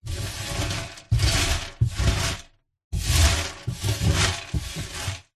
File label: stones small gravel sweep collect by hand on empty wooden box lid; foley; recorded inside; zapsplat